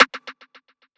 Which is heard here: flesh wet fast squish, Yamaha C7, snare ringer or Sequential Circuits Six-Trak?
snare ringer